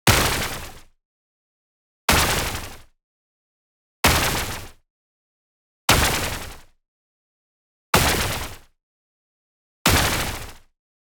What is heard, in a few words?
IMPACT WHITE NOISE Gritty Crunch; boom library; Anime Essentials